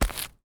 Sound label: dirt, footsteps; player; Dystopia